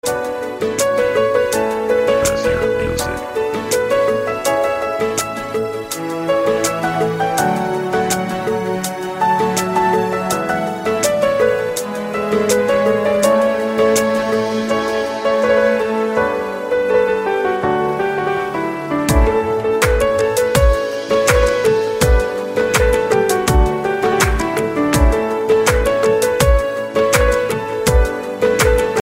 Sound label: instrumental